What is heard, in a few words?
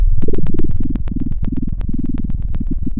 pop, Sound of a cat purring